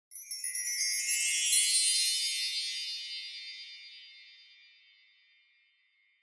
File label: Wind Chimes Reverb Up High